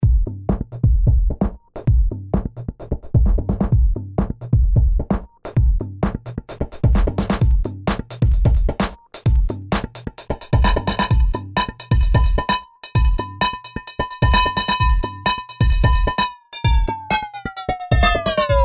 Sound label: small handbell